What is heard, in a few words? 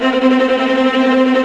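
TREMOLO STRINGS C4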